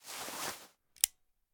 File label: Take Out Flashlight; LOOPERS